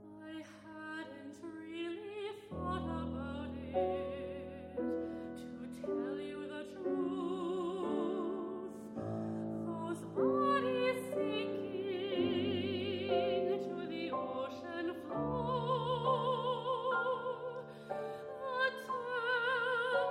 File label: Whalefall vocal